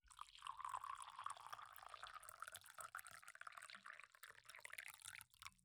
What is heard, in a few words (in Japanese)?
ローションぬるぬるすまた, ASMR; 『ローションぬるぬるすまた』ASMRver